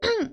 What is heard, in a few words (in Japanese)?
咳; Flashpoint Ultimate 13; 咳・くしゃみ